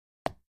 Dart Hits Target, darts

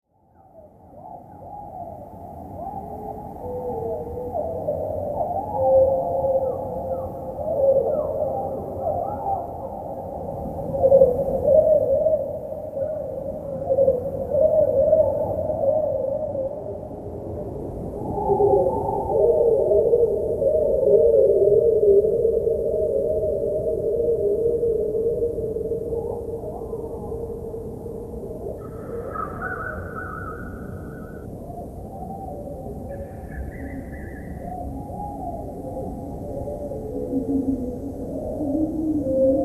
Bird Bg Speed Thro

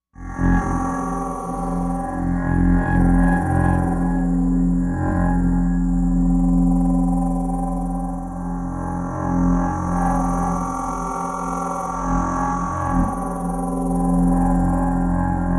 Scanner Space Scanner H

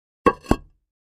Patio Square Drop

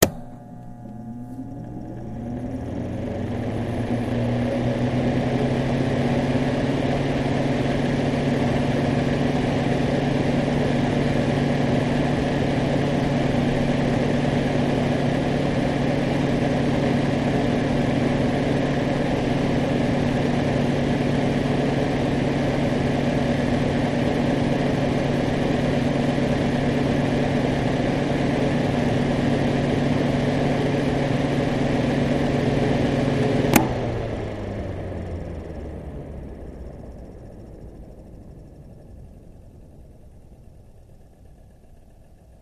Machine Wind Updown, variable speed

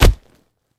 Bag 50 Pounds Throw

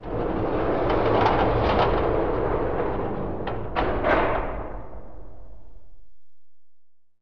Jail Door Large Metal, sliding

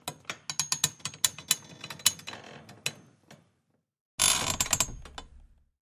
Springs Camp Bed Creak, bed squeaks

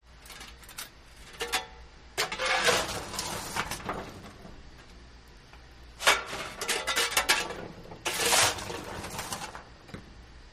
Shoveling Coal Metal, steam train

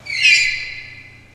Car Tire Screech

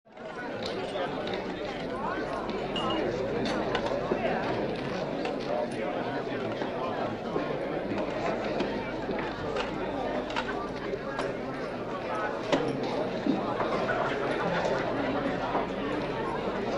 Restaurant; Restaurant Chatter Busy But Subdued.; restaurants

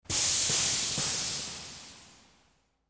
Air Hiss Pressure Re

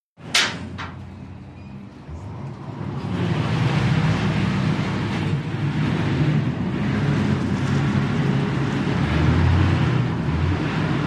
Ferry Depart Gat

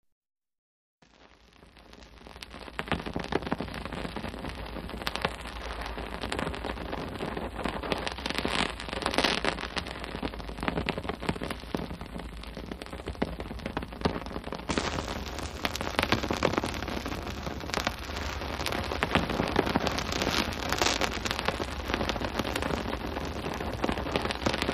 Hand Held Torch Fire; torches

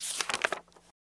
Book Page Turns

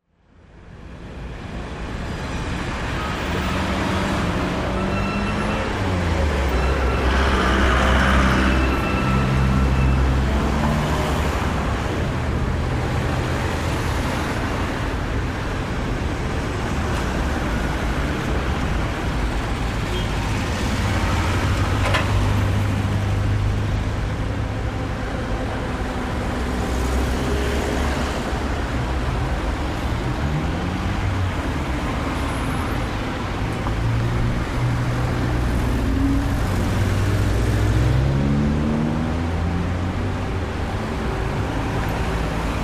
Traffic City Med Cross, big city

